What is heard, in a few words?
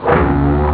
elec gt up; jazz jackrabbit 2